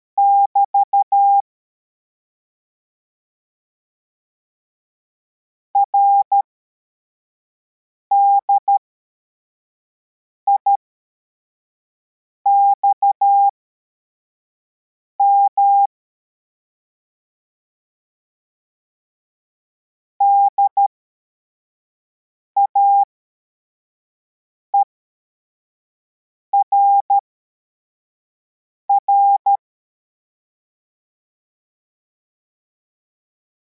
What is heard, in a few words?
cw, morse